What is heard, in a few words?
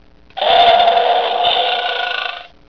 tiger; alternative tiger light